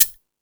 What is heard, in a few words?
Percussion FX, E-MU Pro-Cussion